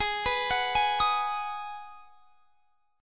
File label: G sharp Minor 7th G sharp; G#m7 arpeggiated; x,23,21,23,21,23